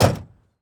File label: Semi Truck Door; Large Vehicles; Blastwave